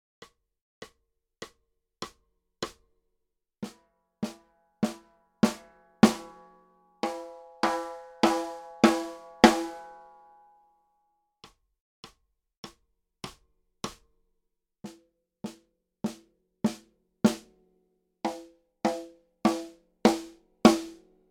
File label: Snare Drum - Hickory Sticks